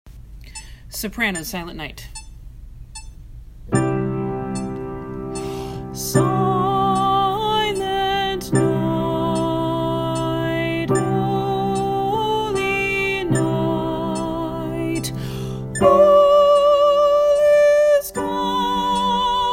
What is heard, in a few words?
soprano silent night